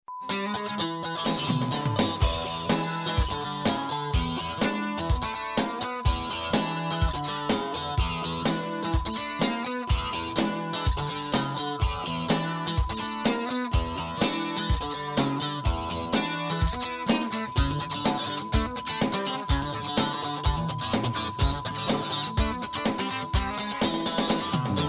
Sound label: Bassman Sound Works